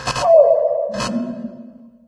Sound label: cave siren loop; ambient; source engine; ep2; outland